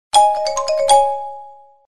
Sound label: Mallets, SMS; Alcatel OT-V555 Vodafone 555